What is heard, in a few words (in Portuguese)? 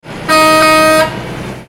buzina de caminhao